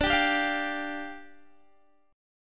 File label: D Minor Double Flat 5th, down, Guitar